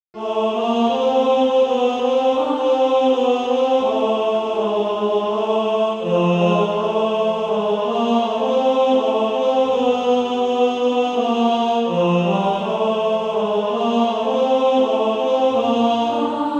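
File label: bass, Practise Music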